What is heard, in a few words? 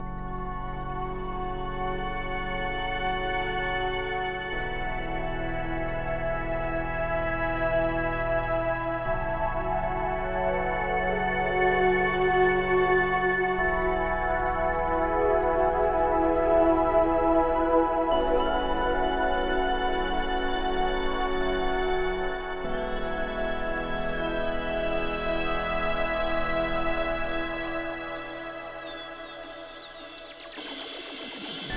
starting Ambient Music; Ecco the Dolphin